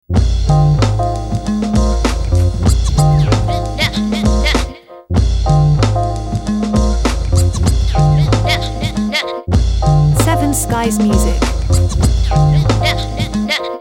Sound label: Fun time loop